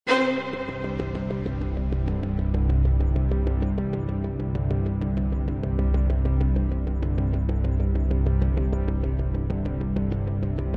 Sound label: background music